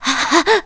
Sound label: Startled; Mila startled (while stealing); Zelda